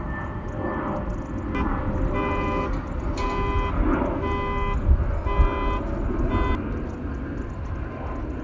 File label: transportation horn